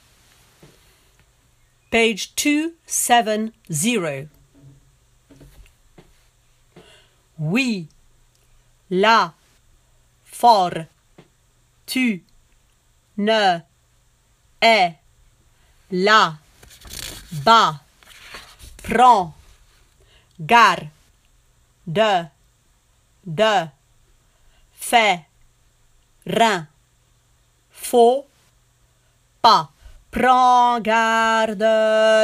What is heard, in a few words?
bas; Carmen